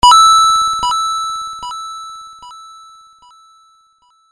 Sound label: MAAADDD Efx Coin king hype; Super Mario Bros